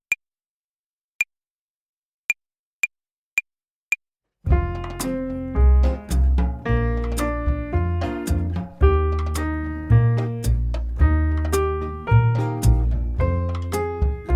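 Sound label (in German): Akkordzerlegungen Übung, Play along Slow, Klavier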